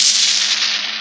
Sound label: snare like noise; single hits